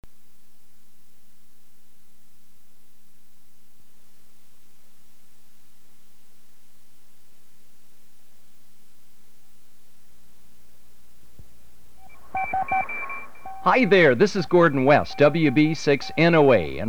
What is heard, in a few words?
Morse Code 5 WPM